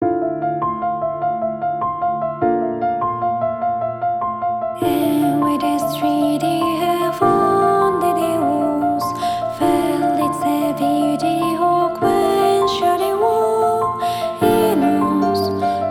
BGM card field

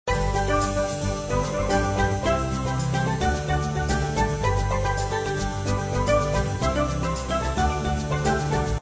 End Loop; Fly Guy

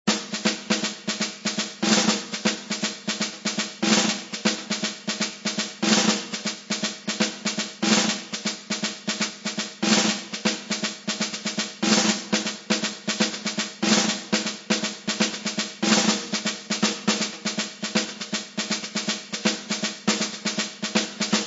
Drum

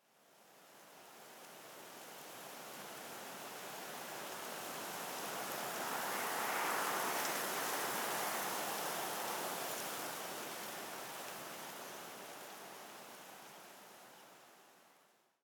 Swamp Wind Swell; Levels Hub Swamp

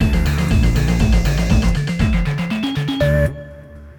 music loop winner end stinger

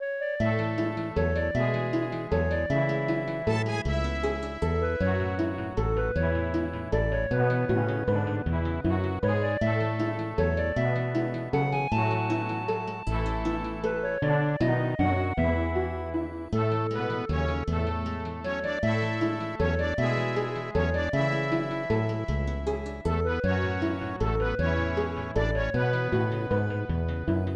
Stable; Super Keiba 2; SNES

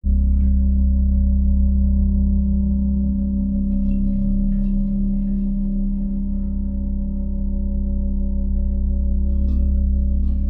Death loop; roguebook

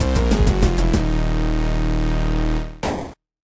¡Times Up!; Loco Tower

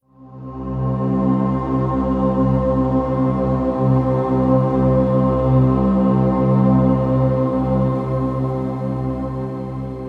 Stinger Puzzle Drone

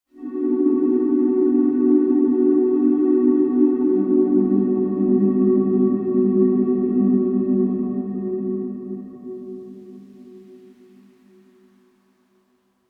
Compass Water Flute

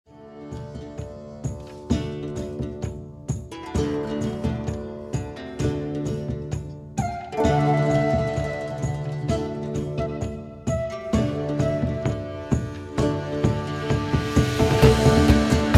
medieval tavern; Shadow Legend VR

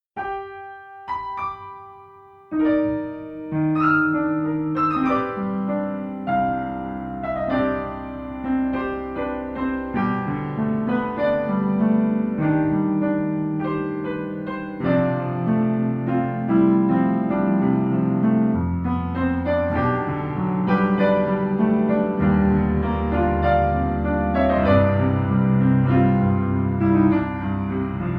Main Theme (Piano); The Hidden Treasure of Area Zero Part 2 The Indigo Disk

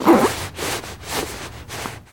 Prisoner Disrobe

